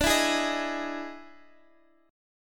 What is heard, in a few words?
E Major 7th D, E/D Major 7th chord; EM7/D Chord {X X 12 9 9 11} strummed